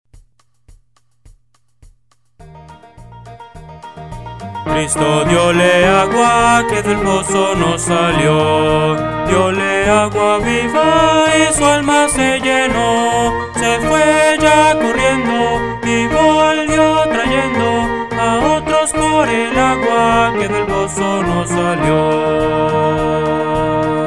Cristo dióle agua, Himnos Cristianos

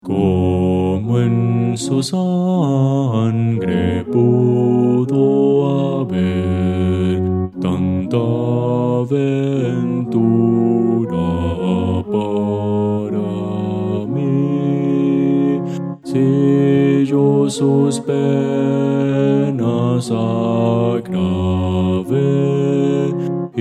bajo

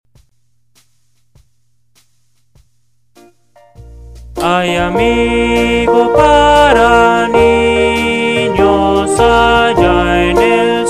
hay amigo para ninos, himnos cristianos

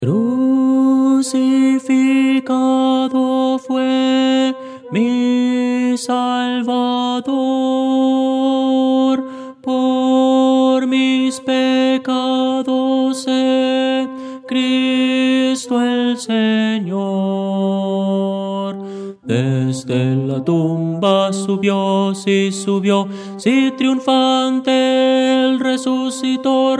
tenor

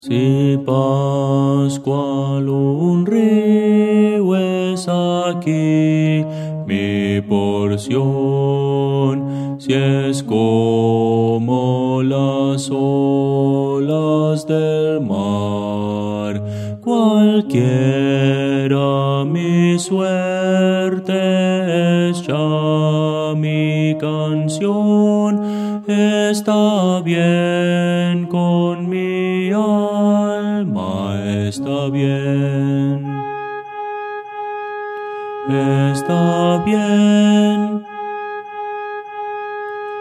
bajo

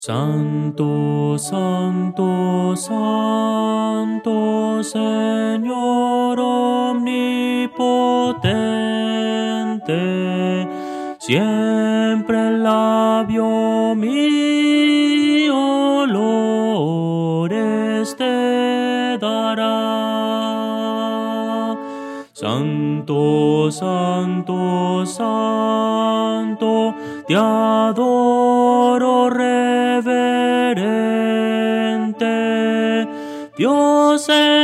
melodia

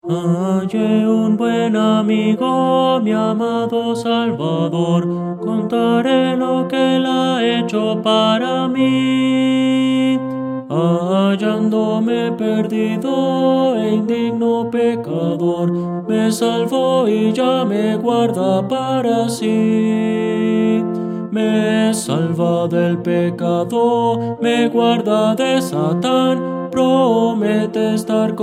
tenor, himnos cristianos; halle un buen amigo

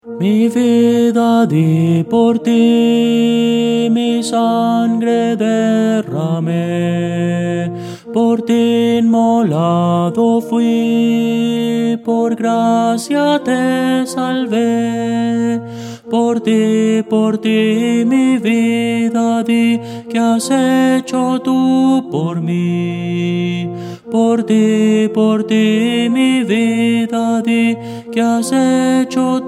tenor, himnos cristianos

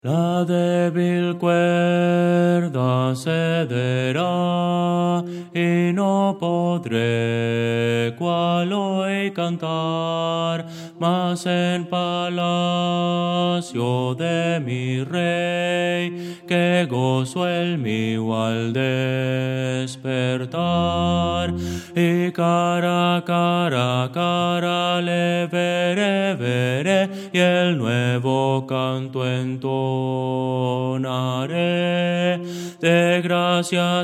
bajo; la debil cuerda cedera

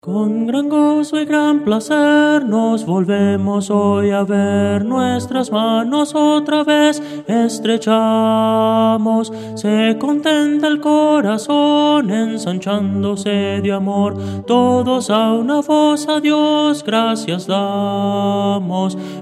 tenor, himnos cristianos; Voces para coro

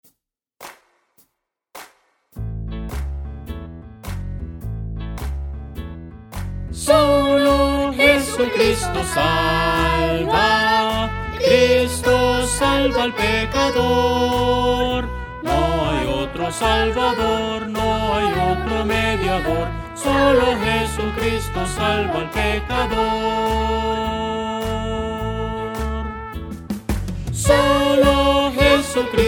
solo jesucristo salva, Himnos Cristianos

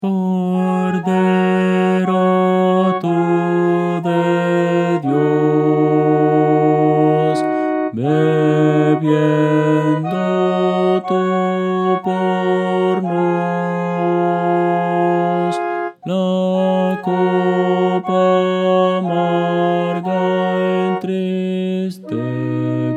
contralto; Voces para coro

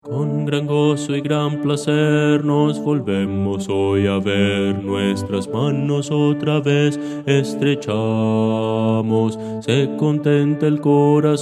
bajo, himnos cristianos

